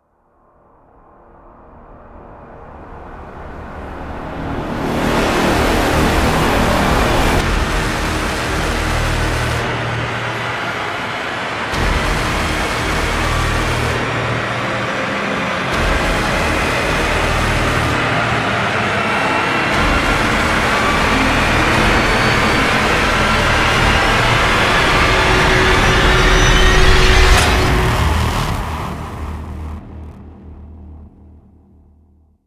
Rise; Ninja Tracks

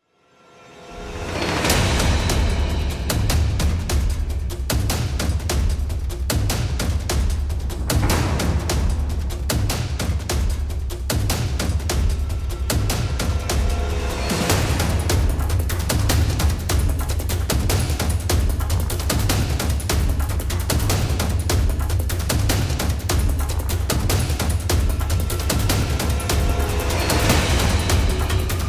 Percussion; Fired Earth Music

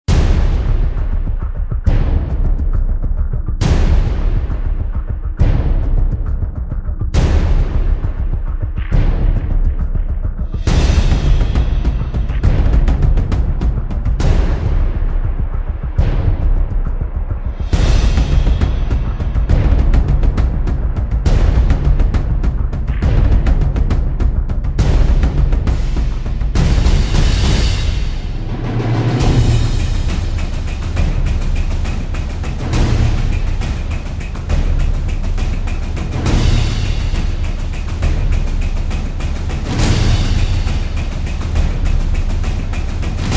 Drums Only Version

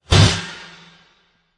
Attack Sharp; Gothic Storm Music

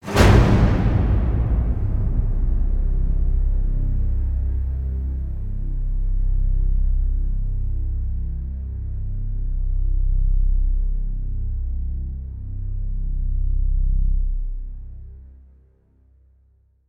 Hit Title Vanishing (C); Brand X Music; Brand X Music Catalogue - Editor's Essentials

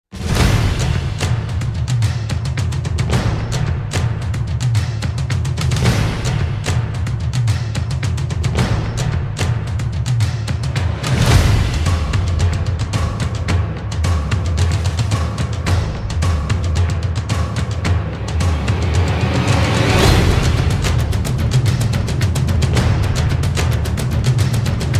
Drums

